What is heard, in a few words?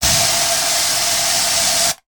household air freshener aerosol can spray long